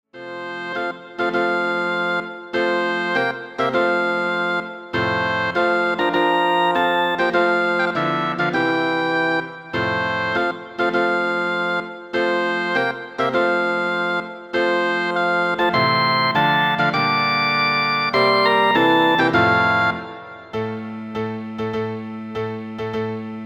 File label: Oboe Bassoon